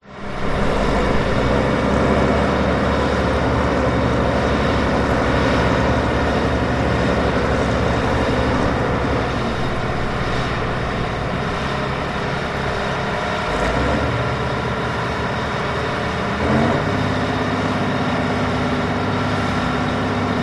vehicles boat dredger operating harbor recorded from rocks waves lapping